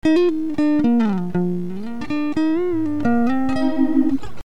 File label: vibrato